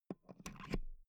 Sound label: insert euro plug hard